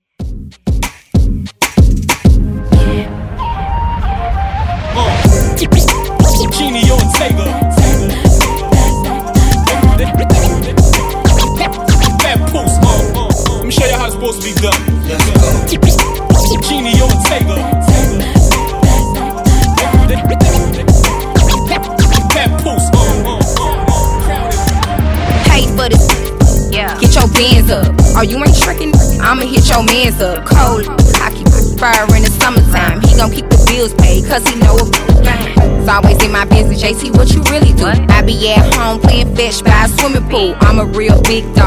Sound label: Intro Redrum Clean